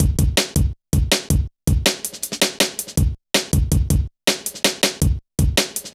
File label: Kicker, Jungle, Drum Loops 161.5; Zero-G Jungle Warfare 1, 2 & 3